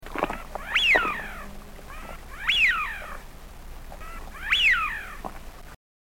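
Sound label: sviyaz voice, Eurasian wigeon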